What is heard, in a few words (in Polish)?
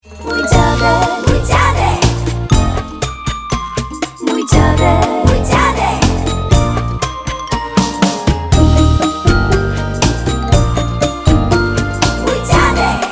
instr